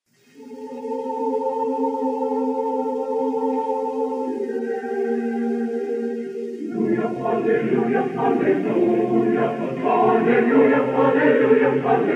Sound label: Brazilian Psalm 'Alleluia' (Acappella), Choir; USC Pantheon Choir -Pgh